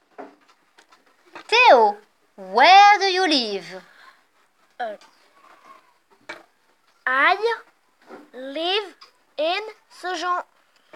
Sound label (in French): vocal